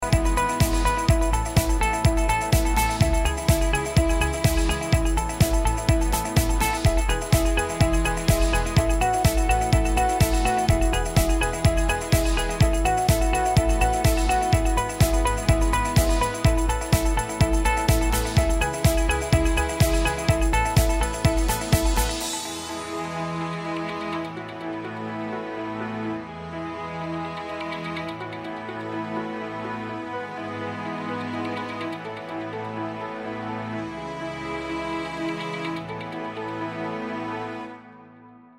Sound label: Happy Corporate